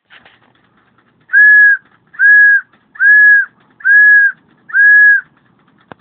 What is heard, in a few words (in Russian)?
svistok sobak